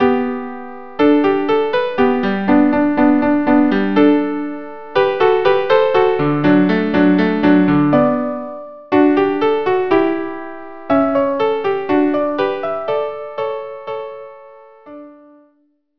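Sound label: violin viola andante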